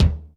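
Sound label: kick, funk kits; Crypton - DrumBase5000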